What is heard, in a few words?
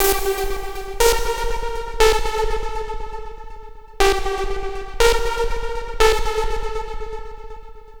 Trance Synth